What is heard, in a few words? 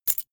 key ring mid size catch; objekte; schluessel